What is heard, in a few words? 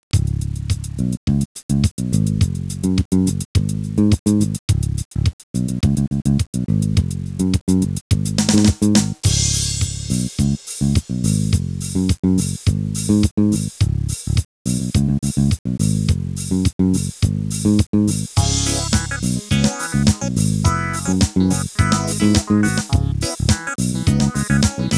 jazzy